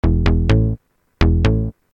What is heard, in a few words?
bass break